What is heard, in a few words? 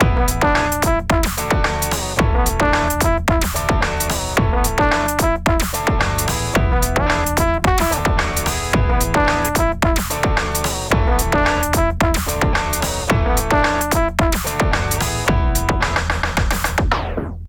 Horn Rock